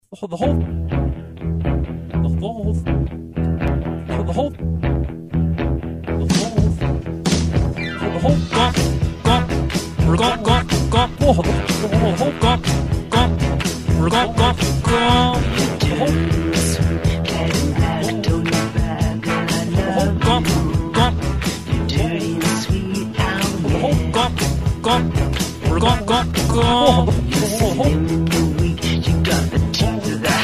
gong

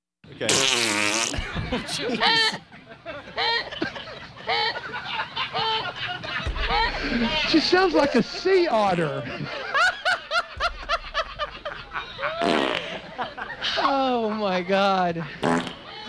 chick laughing

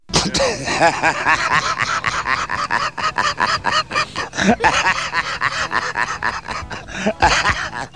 caddy laugh; ftworth

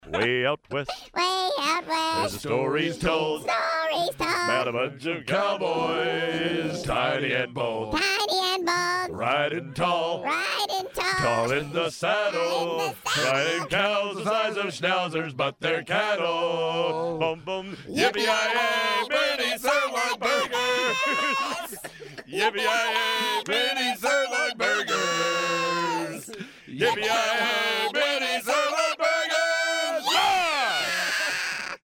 Mini Sirloin Song Only; Hardline Crew

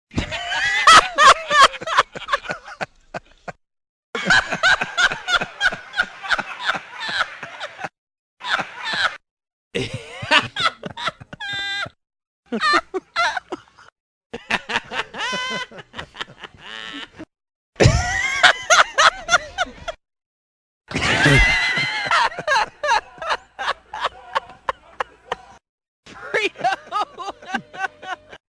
laughs

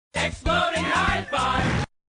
Exploding High Five; BaD Radio; Awesome Town

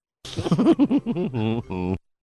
giggle

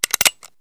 gun cock

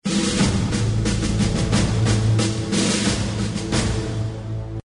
drumroll

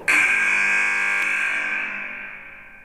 short shot clock

